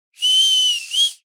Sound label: Human whistle short fingers call attention